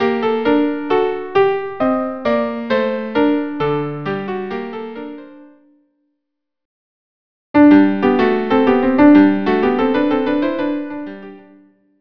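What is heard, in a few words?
violin viola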